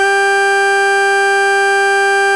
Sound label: FARFISA ORGAN